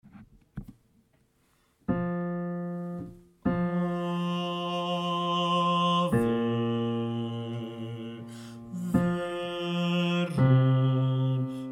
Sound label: Ave Verum BAS; Penalosa